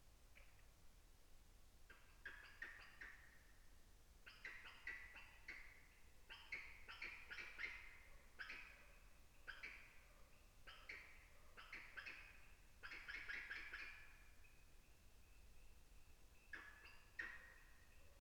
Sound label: lepilemur ruficaudatus